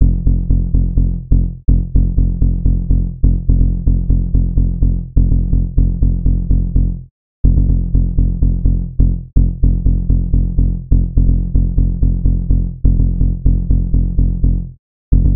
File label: tech house bass rhythm punchy - f maj; instruments; loops